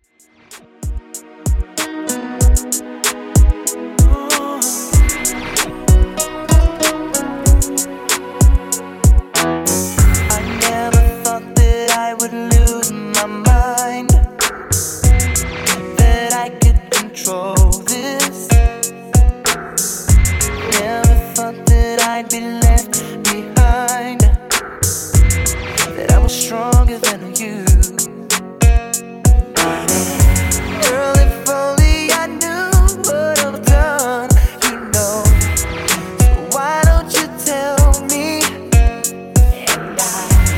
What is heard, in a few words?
95 Bpm; Redrum